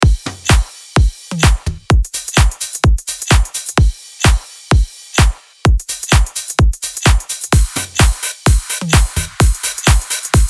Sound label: Beat